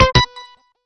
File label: menu prev